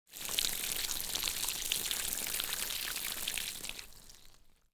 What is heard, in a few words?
Watering Kettle